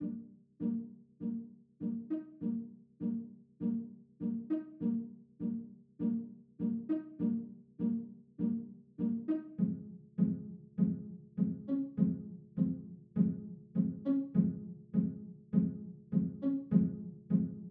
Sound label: Full Strings Pizz; Sony; ACID Pro 7.0